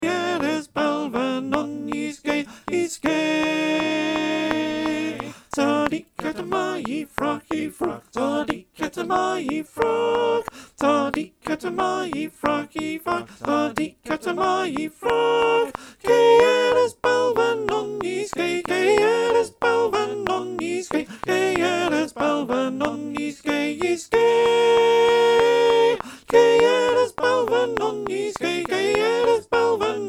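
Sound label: Soprano clicktrack, choir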